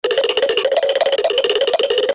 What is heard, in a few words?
Cartoon run